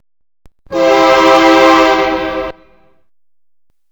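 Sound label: us freight, train whistle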